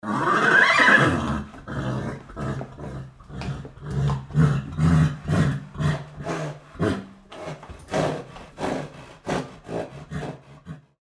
horse neighing

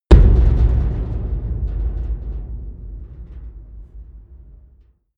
big metal impact hard punch

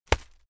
hit plastic fleshy hard

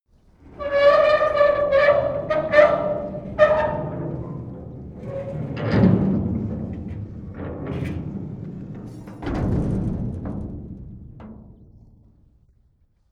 metal gate close long

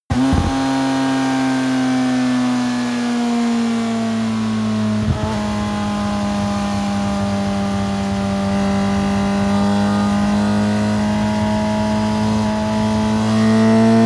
motorcycle sport bike start decelerate accelerate

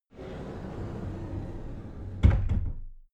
cupboard slide door close soft

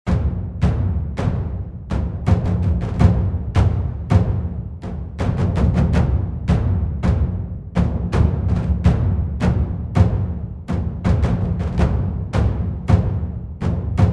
slow taiko groove

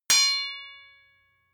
sword impact metal ring heavy

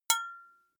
glass metal small impact bright

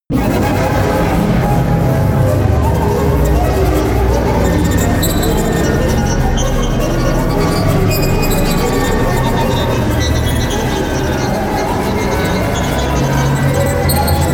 atmosphere dungeon scrape

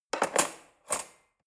cutlery fork drawer handle scrape

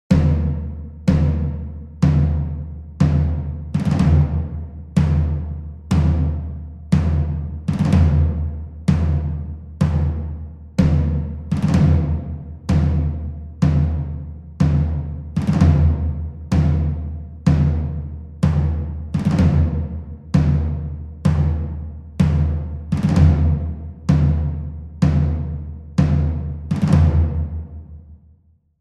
tom groove

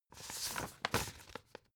old examine

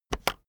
keyboard external enter press fast

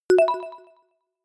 notification short bell

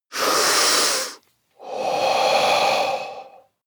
breath male deep

